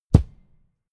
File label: football kick